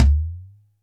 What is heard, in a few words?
PERC. COMBI; Crypton - DrumBase5000